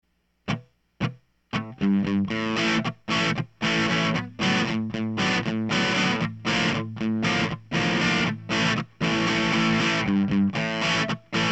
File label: dist Gtr V63M, mic tests